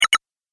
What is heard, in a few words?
Game Pause, video game